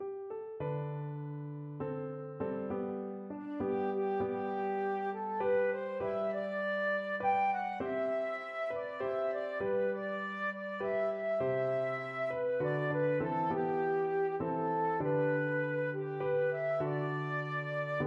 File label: Shenandoah flute